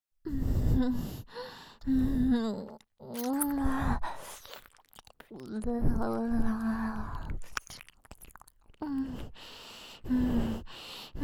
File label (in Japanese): 鼻息耳舐め 左耳